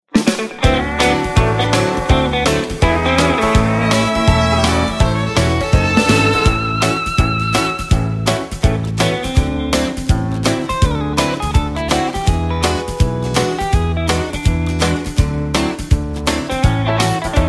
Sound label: (A) No Backing Vocals